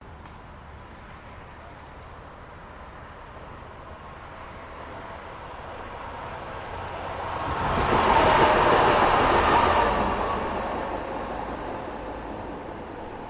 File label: Tram Is Passing By; Budapest Hungary